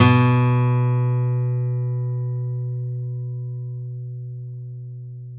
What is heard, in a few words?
STEEL F B1, GUITARS; Synclavier Sampler Library